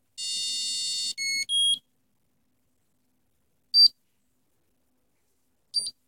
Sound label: Storage out buzzer